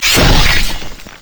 yasuo base basic attack; LoL

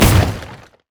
frost flamebreath; LoL; shyvana

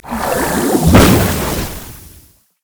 nashor propel; LoL; monsters

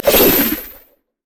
bola strike; LoL; hunter rengar

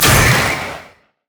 glacial spike; LoL; items

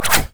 dance strike; LoL; fiora

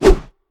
basic attack knee; rengar

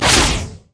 q attack; LoL; jinx base

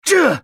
effort; Ezreal

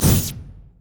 rapid fire; rocket tristana

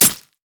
shen basicattack; LoL

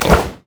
q spell; LoL; ziggs poolparty

